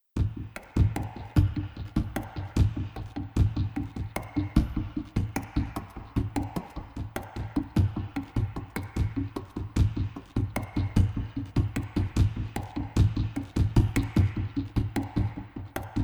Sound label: Phrase Percussion